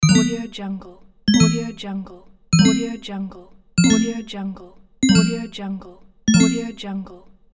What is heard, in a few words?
notification message